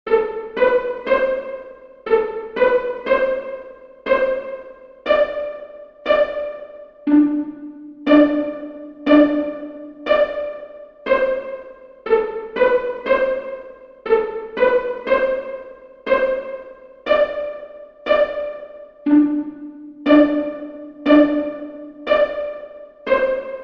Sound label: Second Position Exercise 80bpm, mandolin